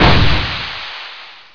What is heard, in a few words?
sun start; Hexen II; crusader